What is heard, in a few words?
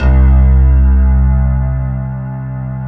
PNO HEAVN; EDENPNO